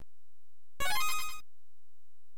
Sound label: Receive Prize; MMBN